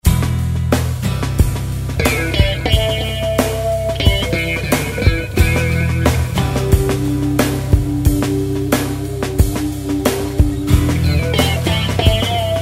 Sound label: Plastic Geetar